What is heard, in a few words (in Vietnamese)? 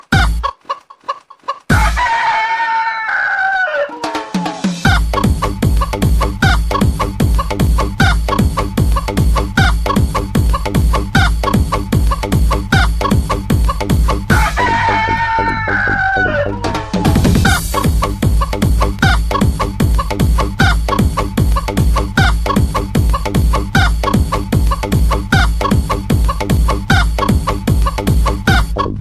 Nhac Chuong Remix